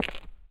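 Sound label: gritty cement run, footsteps; chief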